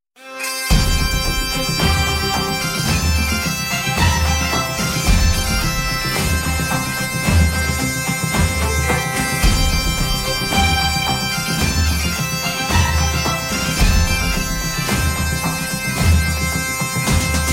bagpipe

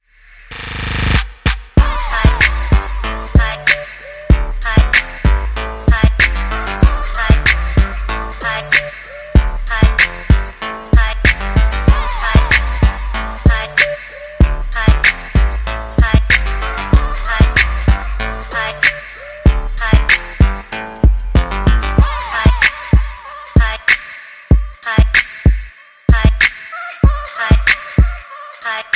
Booty Beat

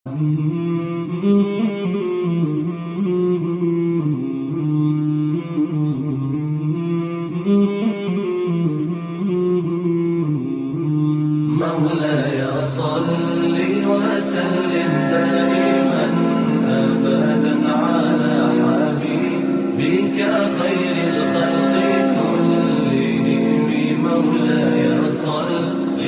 Islamic Naat

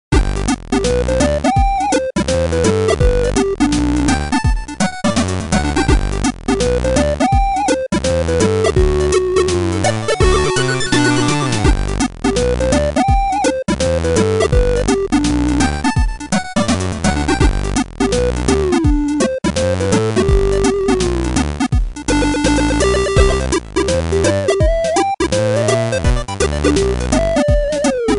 Frequency Groove

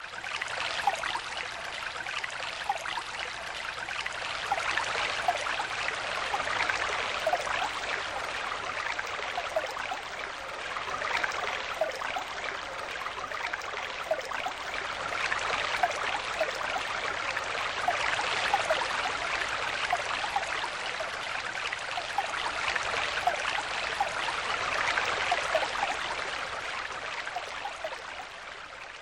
Flowing Water

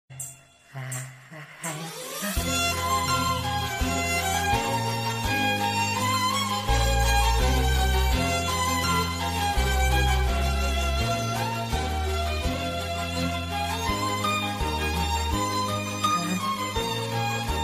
Emotional BGM

